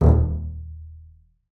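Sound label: marcato D#1 right, bass spiccato; Xsample VOL-5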